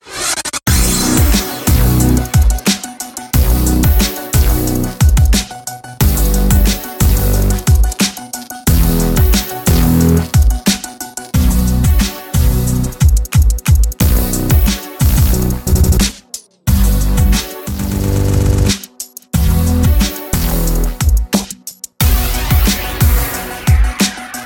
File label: Bed Rock